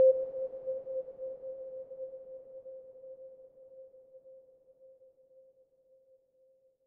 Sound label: Notification